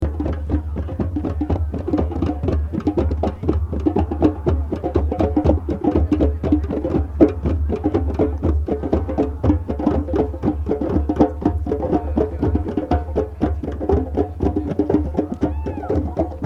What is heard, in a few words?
Drums; Utah 2003; Rainbow Music